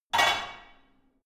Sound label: barbell only replace hard; sport freizeit; fitnessstudio